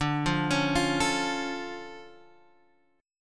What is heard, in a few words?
D Minor Major 7th D down Guitar Standard; DmM7 arpeggiated; JGuitar